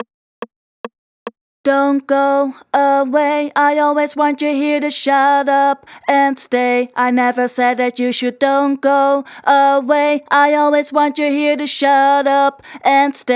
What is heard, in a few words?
Chorus (2nd Voice), 142 BPM; Vengeance Samples; Hello You